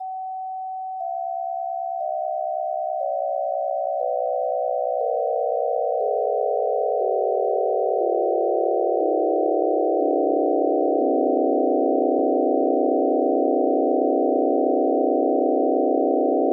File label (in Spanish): acorde 5 sext 8va Alta